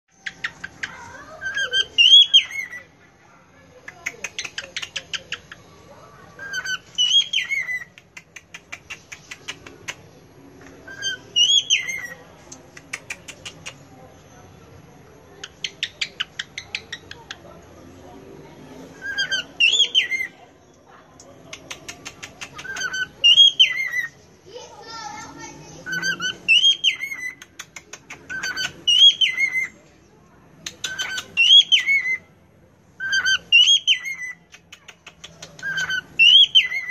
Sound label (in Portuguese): Canto da Calopsita